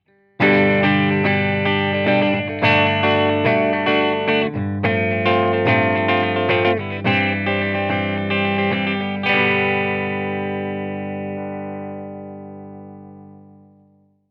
clean 6L6 bright; brunetti pirata141

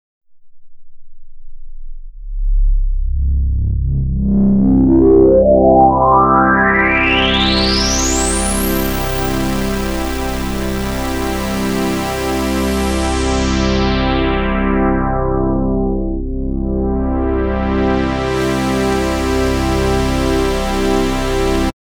tube; fabfilter volcano2

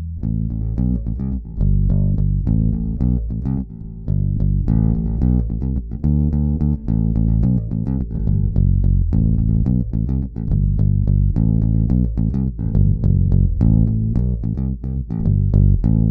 mediator, extreme4; schecter extreme 4

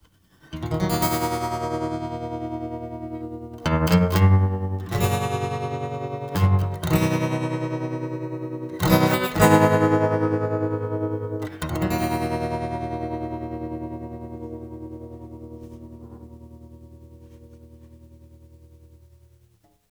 tremolo; tc electronic g natural; Les effets de trémolo

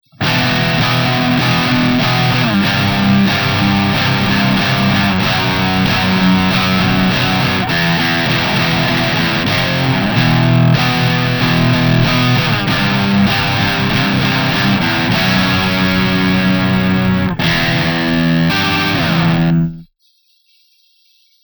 tonezone metal, fender hotrod57

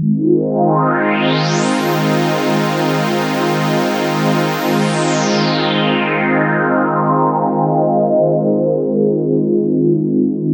openclose lpf, waldorf blofeld; lpf 24 ppg 12

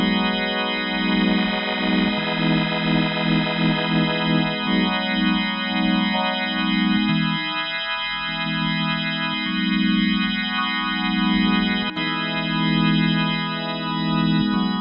b3, Yamaha tyros3

